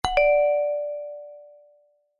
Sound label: household doorbell chime classic